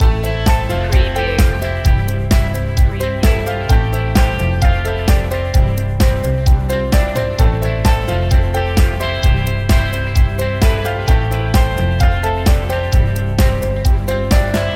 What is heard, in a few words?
cheerful inspiration loop